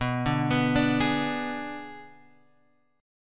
B Minor 6th B, Guitar Open G

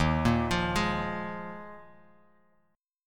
Eb Suspended 2nd Suspended 4th, Bass Standard; Ebsus2sus4 arpeggiated; JGuitar

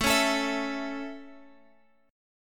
A Major, Guitar, Open G

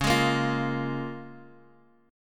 Db 6th; strummed; x,4,6,3,6,x

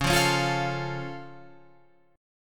Db Augmented, down, Guitar, Open F; strummed; JGuitar

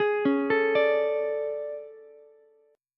A Major 11th, down Ukulele C6; AM11; arpeggiated; JGuitar

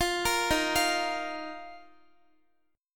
D sharp Suspended 2nd, Guitar; D#sus2; arpeggiated; x,x,15,15,15,12

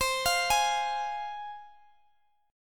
C Suspended 4th Sharp 5th C Guitar DADGAD; down; chord shape

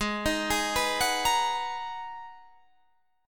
G sharp Minor 9th G sharp, Guitar Standard; G#m9 arpeggiated; 16,18,18,16,19,18; JGuitar

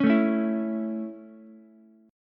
Db Minor Sharp 5th Db Ukulele; strummed; Dbm#5; JGuitar

